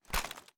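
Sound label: submachine gun inspect; gmod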